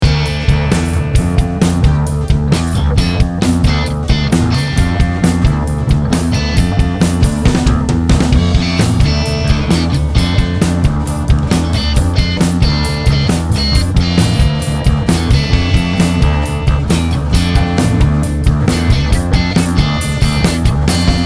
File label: blues